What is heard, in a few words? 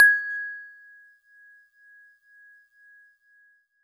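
Celeste DX100 G#1; Pristine Celeste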